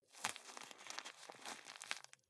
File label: juggle squeeze